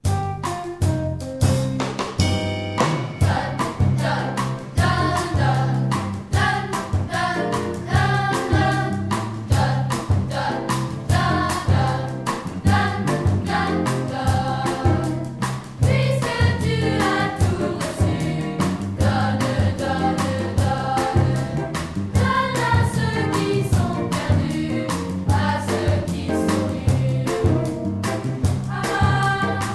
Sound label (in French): donne donne donne voix polyphonie